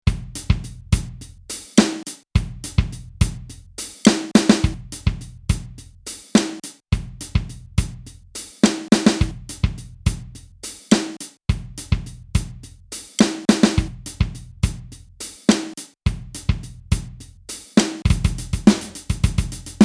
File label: Pop